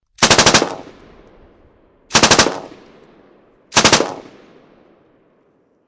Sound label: M249 3 Bursts Bridge; Machine Gun; Machine Guns; VRSonic